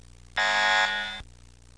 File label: ALARM; Sound Library 1